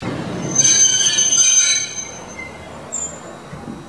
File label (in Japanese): densya braking sound